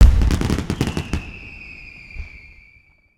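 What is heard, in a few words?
cannon explode; super cannon; destructibles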